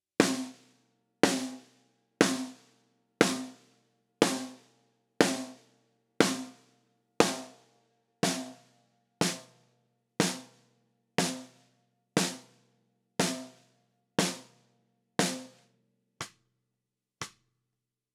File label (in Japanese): ドラム サウンド SNARE